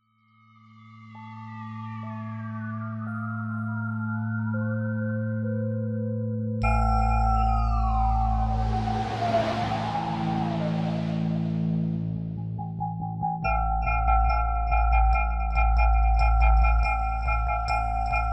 modal

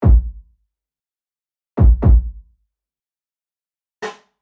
Studio Berlin Live Room; Hansa

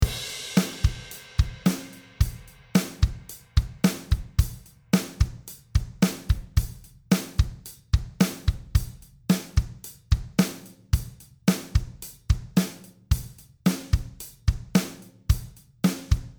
altiverb 8 xl drums dry; audio ease

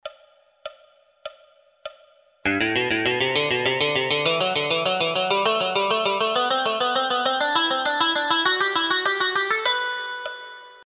SCALES

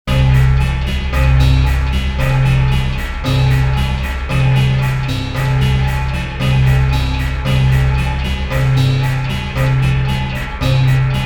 Sequential Prophet X Chamber Music

